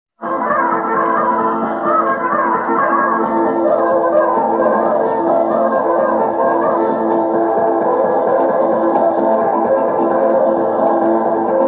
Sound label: circus fingers